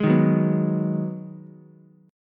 Ab Minor 7th down Ukulele; strummed; JGuitar

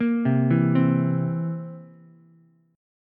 A sharp Suspended 2nd Flat 5th, down, Ukulele; arpeggiated; A#sus2b5; JGuitar

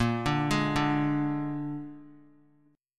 Bb 7th Bb down 3C,3F,3A,3D; arpeggiated; JGuitar

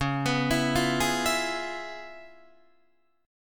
Db 7th Sharp 9th Db down Guitar Open D

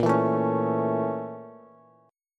A sharp Minor Major 7th Flat 5th A sharp Bass; A#mM7b5 strummed; JGuitar

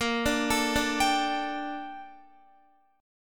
Bb Major 13th Bb down Guitar; 4F,4A,5C,5G,5C,6E; arpeggiated

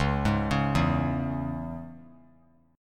C sharp Minor, chord; C#m; arpeggiated; JGuitar

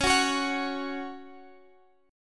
F sharp 5th C sharp

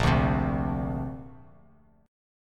Bb Major Add 9th Bass Standard; x,1,0,5; strummed

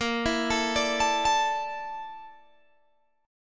A sharp Major 7th Flat 5th, Guitar Open G

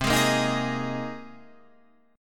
C Minor 7th Sharp 5th C

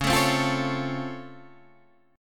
Db Augmented Major 7th, down, Guitar, Standard; strummed; JGuitar

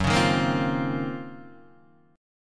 Eb Minor 13th Gb

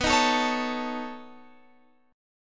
B 9th B down Guitar Open F; strummed

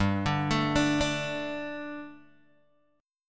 G Suspended 2nd G, Guitar Open G; chordshape; down

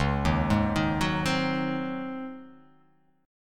Db Major 7th Suspended 2nd Suspended 4th Db; x,4,1,1,3,1,2,x; DbM7sus2sus4 arpeggiated; JGuitar

